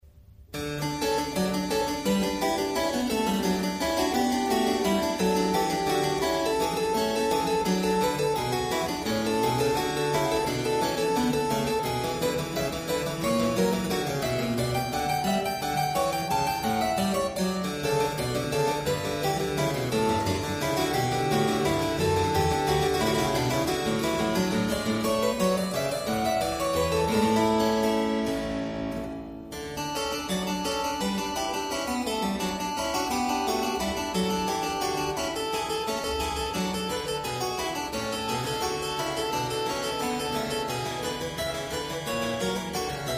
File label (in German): Sechs kleine Praludien fur Anfanger auf dem Klavier in E major; BWV937